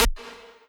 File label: lead